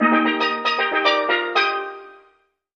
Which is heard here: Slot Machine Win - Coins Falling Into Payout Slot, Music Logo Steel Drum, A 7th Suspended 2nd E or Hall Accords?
Music Logo Steel Drum